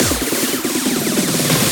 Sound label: Fills, Loops 140 BPM